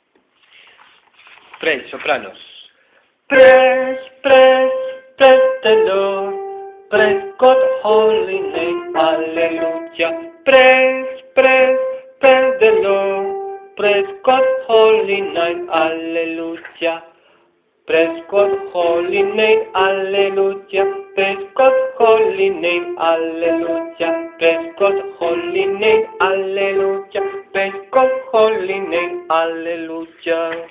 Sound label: soprano